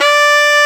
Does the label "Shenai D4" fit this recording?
Yes